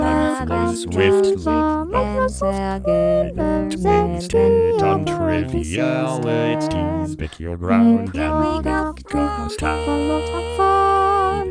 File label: MS Windows XP Startup; 22KHz